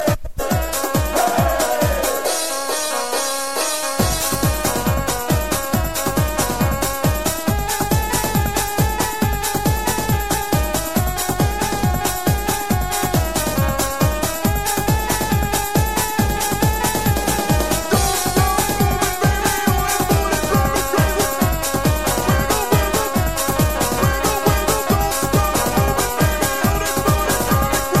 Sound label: beat